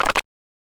landing geta

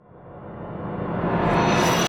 approaching fear

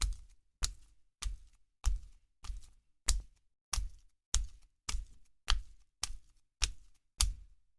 walk poolside

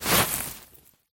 digging hand

